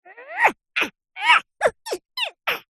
cartoon character vocalisation high pitched fight grunt